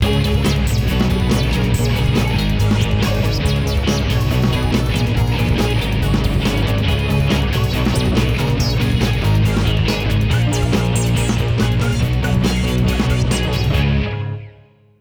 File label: Chunky